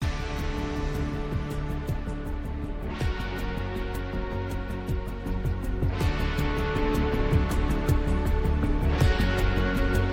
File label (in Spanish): musica clasico de la sala lobby; classic Fortnite